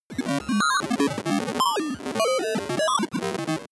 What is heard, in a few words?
broken computer random short